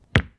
break node hard; Too Many Stones

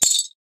tms crystal sounds; Too Many Stones

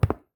hard footstep; Too Many Stones

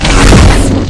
lightsabers clash cross; star wars; adv lightsabers

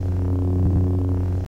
lightsabers idle; star wars; adv lightsabers

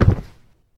player falling damage; MineClone2; mobs

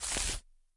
grass footstep; Too Many Stones

